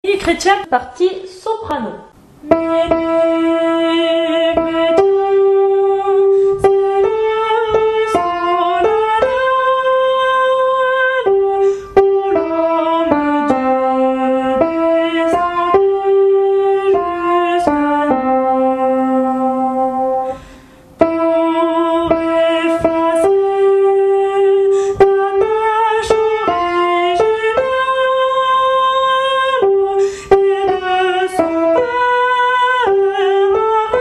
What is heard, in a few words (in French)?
Minuit Chretien Soprano; Adam